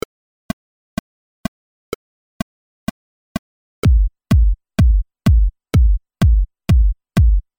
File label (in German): kick beats bauen synthesizer